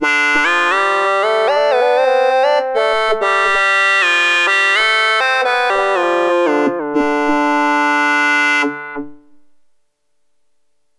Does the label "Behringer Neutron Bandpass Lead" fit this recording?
Yes